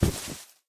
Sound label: Feather Crack; doodle jump 2; android